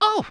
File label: bump; quake2; monkey